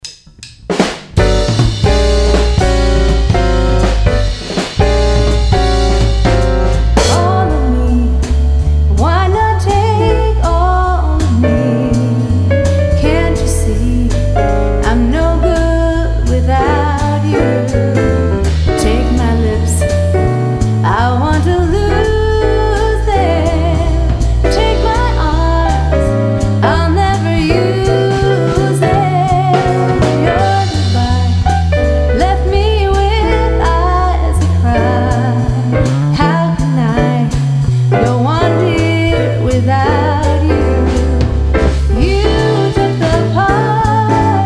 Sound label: female vocals, jazz-band